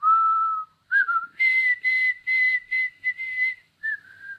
whistle, stalker humming; monsters

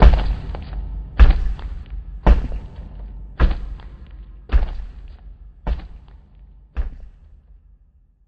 footsteps; ambient

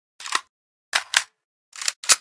fn2000 reload, weapons

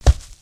hoof ground, step

